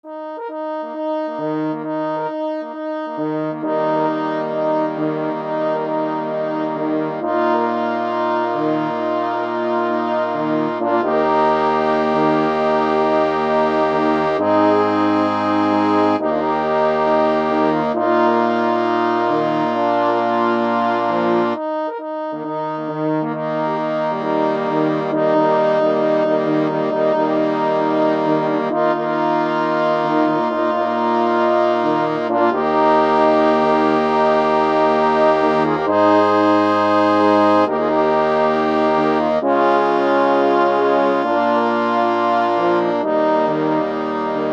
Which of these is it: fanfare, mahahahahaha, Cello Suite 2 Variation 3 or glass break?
fanfare